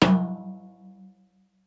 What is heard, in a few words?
tom